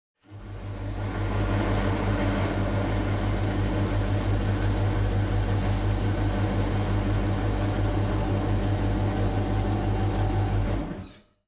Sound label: hangar layer